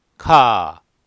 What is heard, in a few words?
car